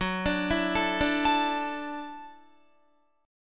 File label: Gb Minor 7th Sharp 5th, Guitar; Gbm7#5; arpeggiated; 14,17,14,14,14,16